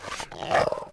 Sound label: Wolf Heal Vox; Civilization4